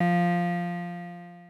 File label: synth poly c2